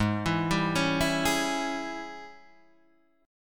G sharp Major 7th Suspended 4th Sharp 5th, Guitar, Standard; arpeggiated; 4,7,5,6,5,4